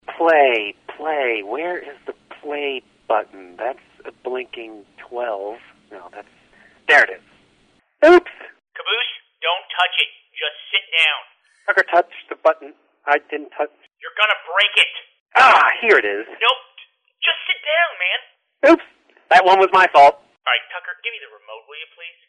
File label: Error; chinese blog